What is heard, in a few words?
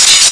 smashing